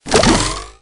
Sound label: tesla open